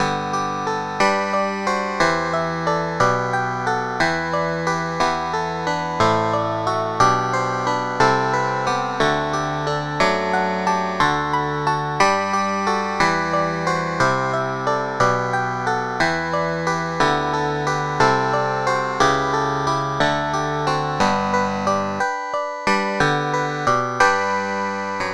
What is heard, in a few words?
clavinet; SpectMorph Evaluation #4